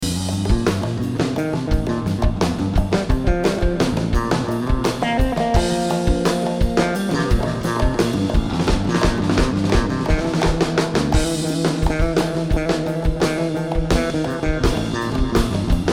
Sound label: Drums and Bass